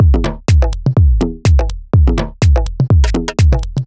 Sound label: E 124bpm, House Drumloops; Zero-G House Fabrik Drumloops